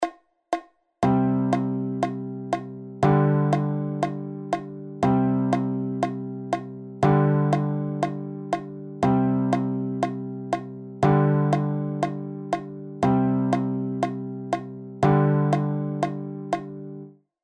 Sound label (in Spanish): CLAVE DE FA